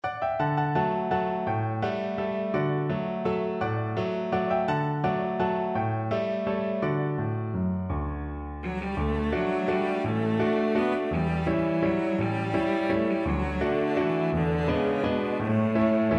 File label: biddy mulligan, cello